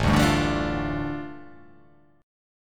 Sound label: A 7th Suspended 4th Sharp 5th, A, down